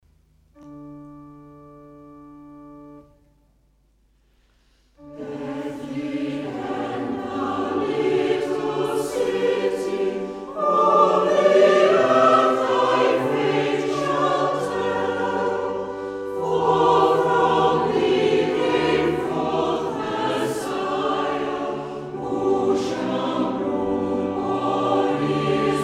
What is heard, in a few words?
Choir, hymns